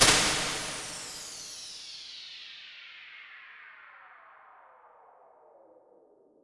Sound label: large reverb